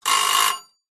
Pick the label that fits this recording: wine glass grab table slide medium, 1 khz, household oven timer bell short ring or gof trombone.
household oven timer bell short ring